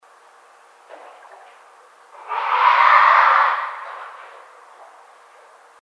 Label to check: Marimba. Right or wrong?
Wrong